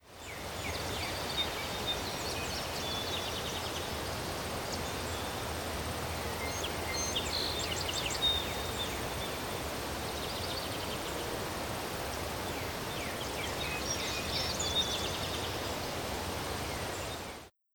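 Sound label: Molokai Farm ambience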